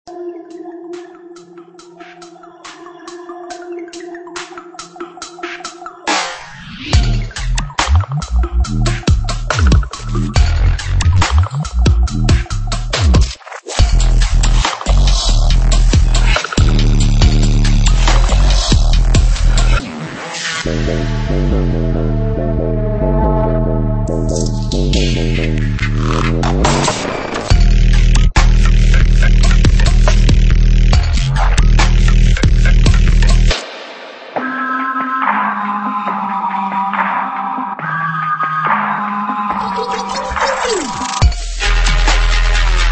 Dubstep; Renraku Ternion Sound Third Wave

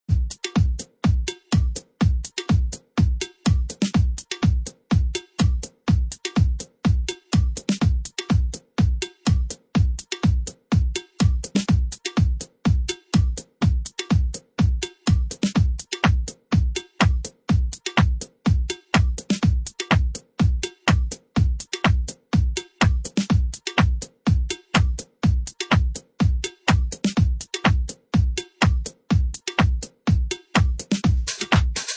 Tech House Loops; Tech House Loops & Sample pack 2018

